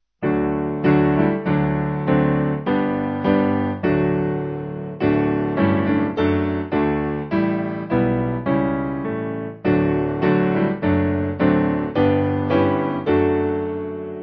King Of My Life, Piano

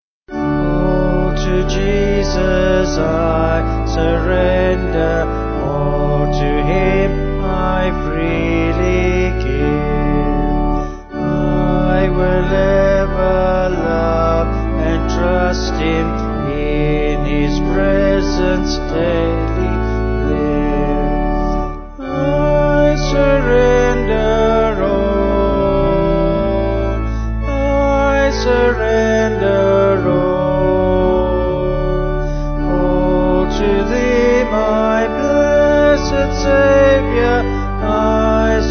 Vocal Organ; All To Jesus I Surrender

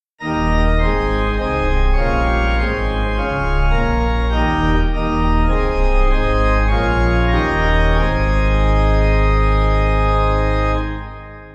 St James Pipe; Thou Art The Way

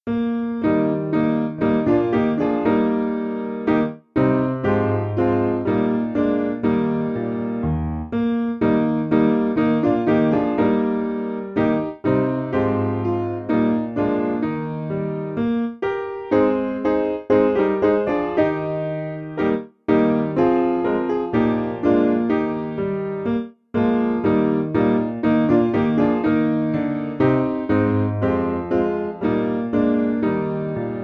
My Song Shall Be Piano

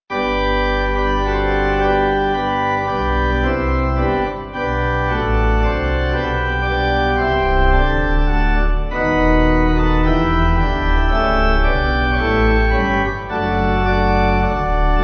Once To Every Man, Pipe; 8.7.8.7.D Hymn

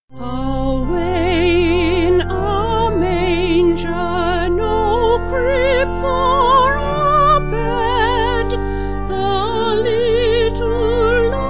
Vocal Organ; Away In A Manger; Cradle Song

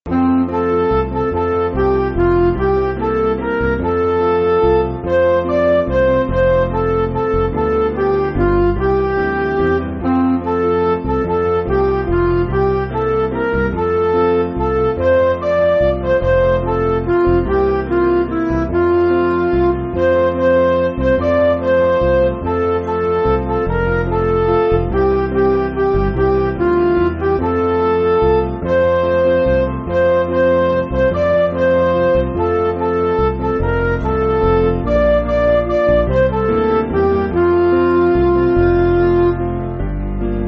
Midi

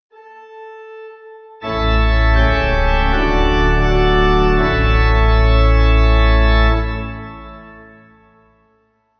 Thank Be To God, Pipe

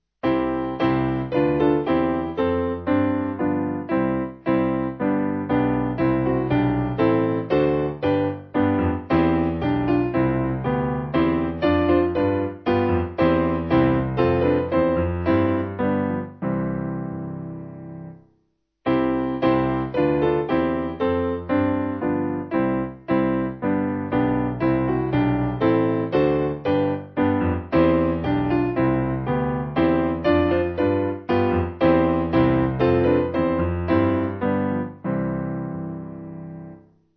Piano, Backing Files for Churches; Simple Piano

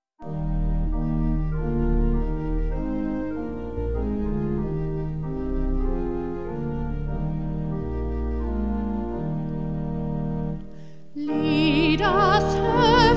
Lead Us Heavenly Mannheim Vocal Organ

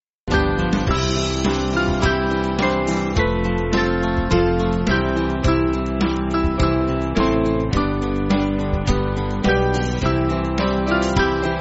Band, Backing Files for Churches; Come And Let Us Bow; Whately

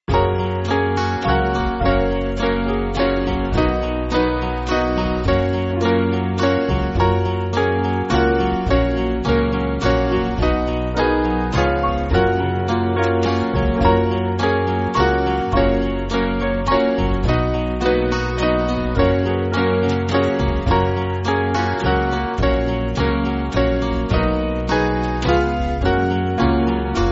Band; Blow the wind southerly

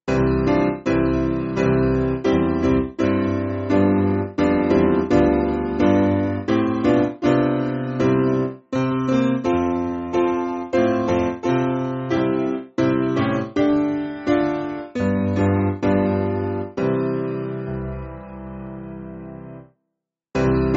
Piano, church music; Children Of The Heavenly; Tryggare

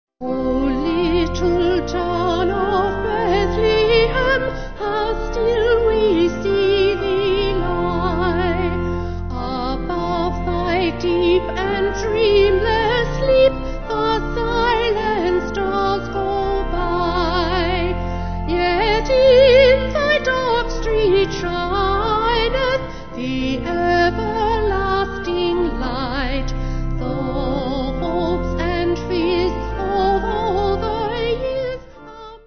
Vocal Organ; Forest Green

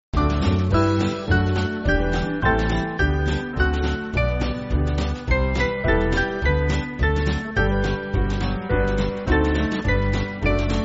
Band, Music Backing Files for Churches

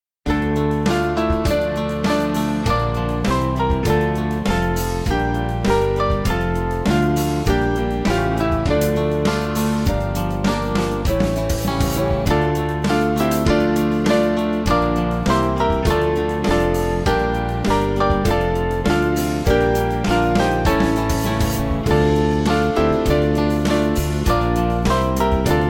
Heavenly Father Lord, Russian, Band

